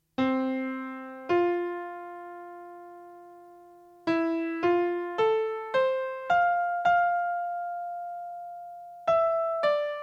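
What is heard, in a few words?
"melody" sequence corresponding to the "mechanical" performance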